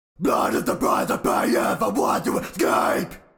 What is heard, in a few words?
vox